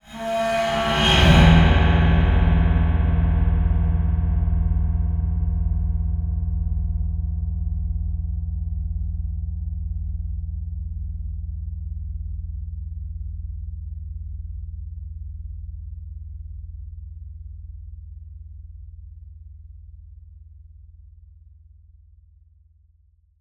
Bowed Cymbal, Mids Lows; Soundopolis; Fienup

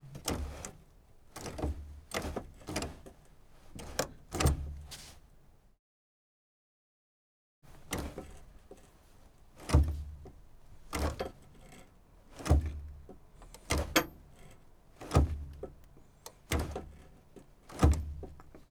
ford escort RS1800 MKII hand brake; 1977; Pole Position Production

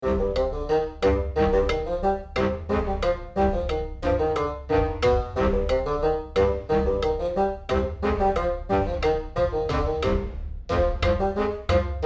Comic Game Loop Mischief

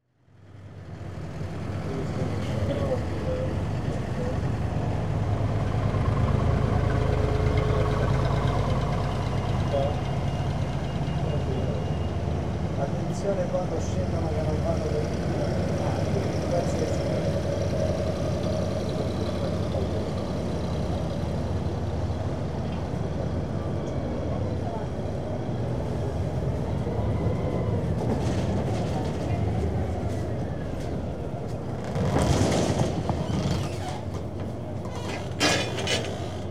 Italy, Water-Bus Arrives At Stop; European Soundscapes; BBC Sound Effects Library

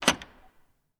Bolt Lock; 99Sounds; The Warehouse

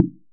impact Wood medium; Kenney's Sound Pack; Impact Sounds

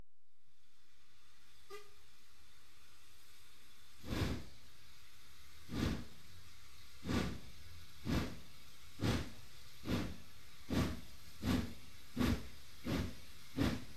Train Starts Steep Grade; BBC Sound Effects Library; Age Of Steam

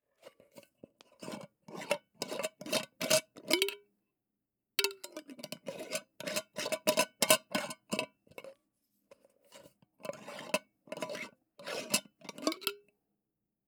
Glass Jar Medium Size Metal Lid Screw On Off; Glassware; Wav Junction Sound Effects

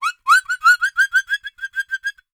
Flexatone Voice Laugh Long; InspectorJ; Hidden Voices

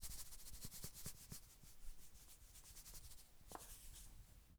sprinkle flour on surface; Kitchen; 3maze

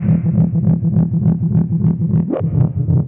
Feed driven, Feedback FX; 99Sounds; Dark Engine

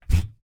FOOTSTEP - Metal Grill Slide Barefoot Male; Timothy McHugh; Barefoot on Metal

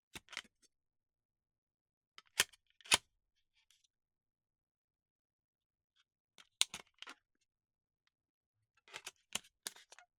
CD,Case,Remove,Replace

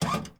Electric Lift; 99Sounds; The Warehouse

